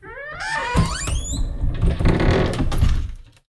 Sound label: door close; Golden Crayon Sounds